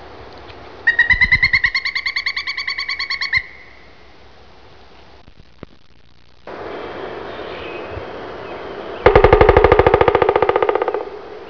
pileated woodpecker

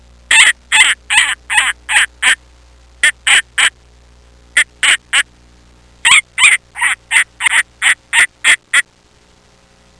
Falcon

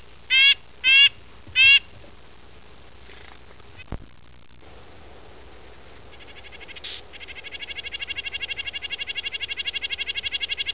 Red-breasted Nuthatch

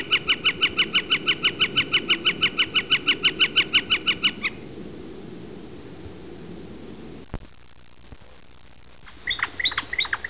Northern Flicker

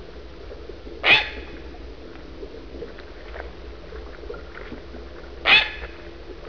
great blue heron

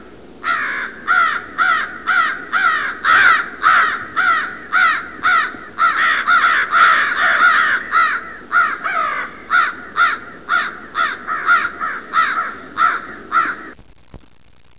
american crow

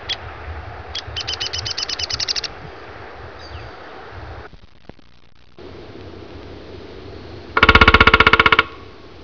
downy woodpecker

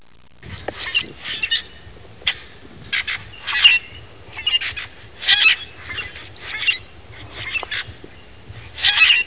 common grackle

